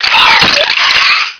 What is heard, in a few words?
zombie idle; zombie soldier